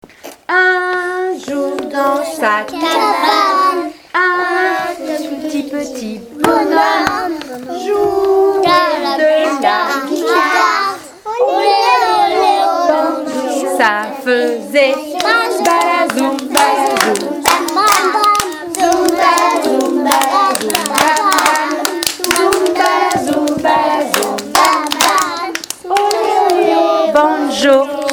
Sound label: banjo